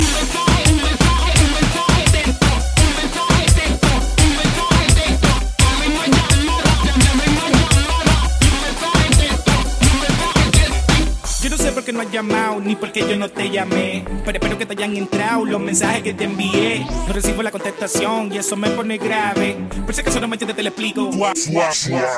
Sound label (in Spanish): un mensaje, ringtones sms